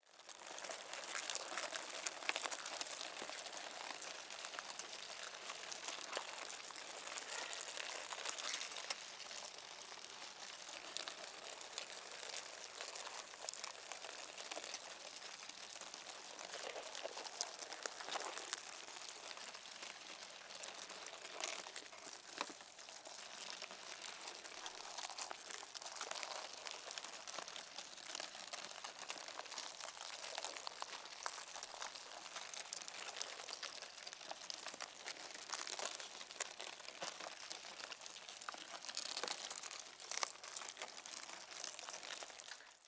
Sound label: mealworms larva movement slimy bugs; A Dump in the Dark